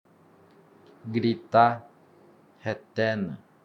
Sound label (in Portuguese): gritar